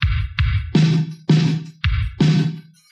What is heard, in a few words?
Drum loops, Electro